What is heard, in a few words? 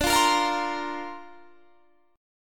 D-Minor 7th Sharp 5th, D; Dm7#5 strummed; 22,20,20,22,23,20